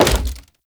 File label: Hammer Impact Wood; Weapon Impacts; Player; Environment; Tuvalkane Hammer